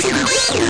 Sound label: merchant bought